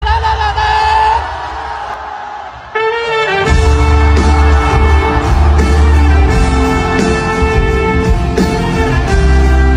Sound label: Trumpet ring tone